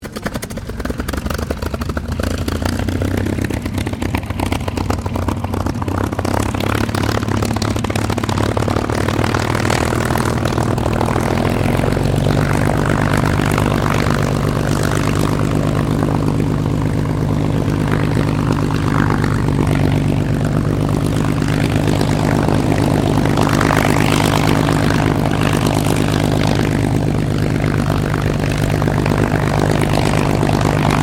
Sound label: AIRCRAFT CAC CA-18 MUSTANG MK23 STARTUP IDLE TAXI; CA-18 /P-51 Mustang; Airplanes (Sound Effects:Vehicles)